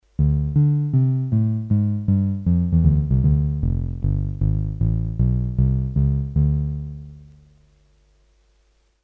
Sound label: Bass; Hohner